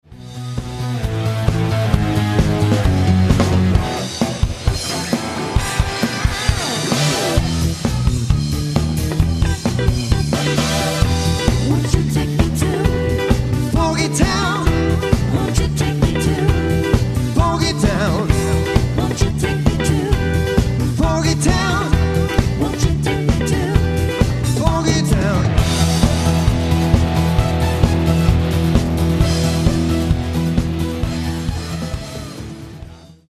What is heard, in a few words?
electric 80s funky